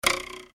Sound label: comedy ruler twang; Comedy / Cartoon Ruler Twang; Funny Sounds